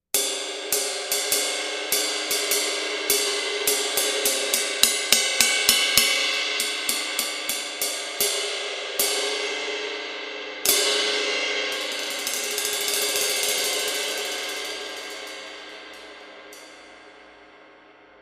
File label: 22 Inch Brilliant Medium Ride, cymbals; Mist